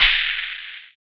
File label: SAND, Drums; Distored Reality 1&2